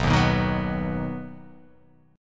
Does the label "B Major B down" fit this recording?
Yes